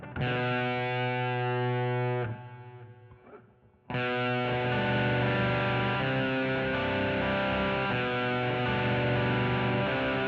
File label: GTR B Solo